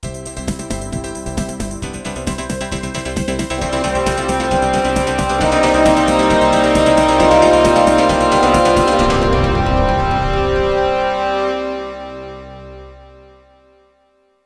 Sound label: News Jingle